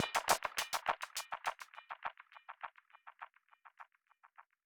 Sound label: music endloop percus; music ftue endloop percus 03